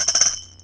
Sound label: straight flush; CrossCards 1.0